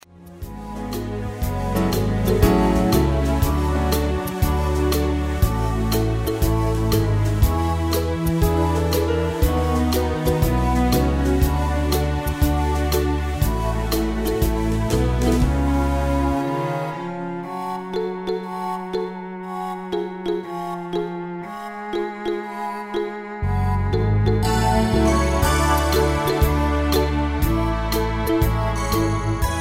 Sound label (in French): instrumental